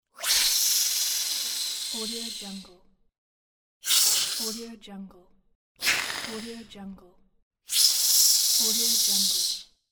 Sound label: spider queen hiss